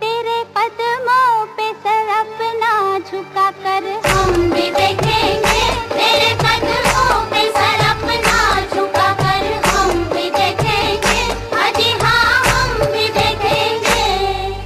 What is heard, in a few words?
Classical Mujra